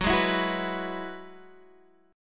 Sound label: F sharp 7th Suspended 2nd Suspended 4th, Guitar; x,10,7,10,8,8; Half Step Down